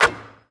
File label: Basket Net Swoosh